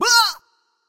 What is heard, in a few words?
boss fight racoon scream